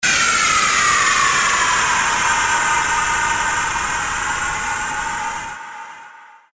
sweep down energetic

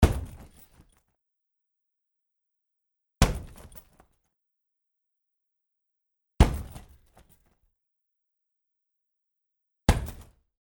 karate kick punching bag

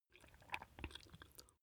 swallow food

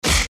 fight swish

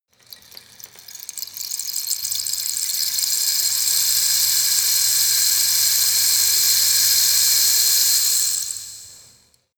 rain stick single

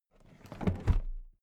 toolbox put down regular

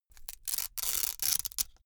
velcro large open slow

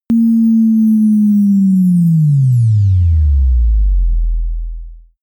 sizzle sine drop

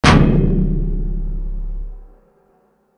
metallic impact

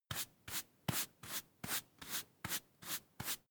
broom hand sweep short sequence

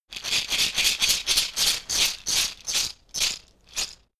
shaker wood rough rattle dynamic movement

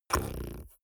beatbox tongue roll soft

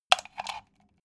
socket plug hard

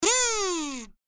kazoo

